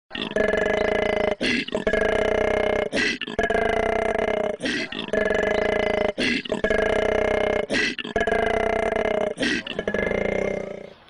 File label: koala